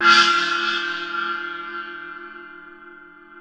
NEPAL CYM, Asian Cymbals

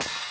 HHO, Dance Mega Drums; Best Service

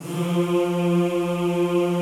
F2 R, Classical Choir; Best Service; Extended Classical Choir

